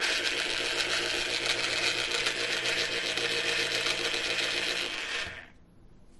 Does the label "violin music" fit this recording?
No